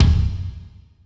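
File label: drum kick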